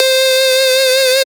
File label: synth wackotomatone C; M8